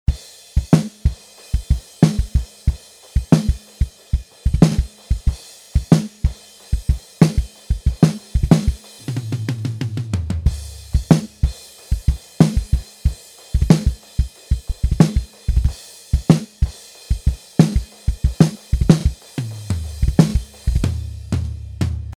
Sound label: drums toms